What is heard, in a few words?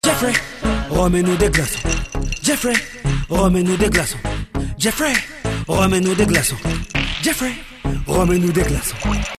soprano la